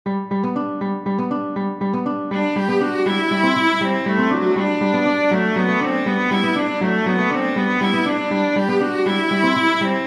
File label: serenade major for viola guitar; hiller ferdinand